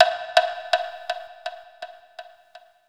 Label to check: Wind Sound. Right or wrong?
Wrong